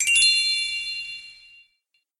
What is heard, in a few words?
shiny bells; MemoryChallenge; Puzzle Games